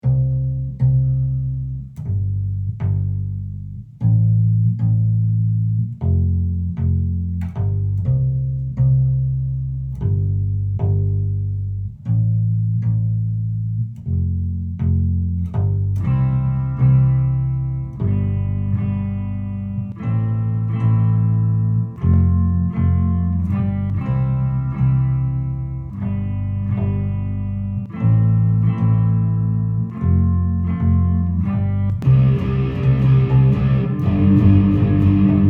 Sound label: Collective Track no piano